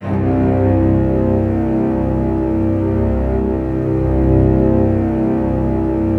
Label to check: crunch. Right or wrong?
Wrong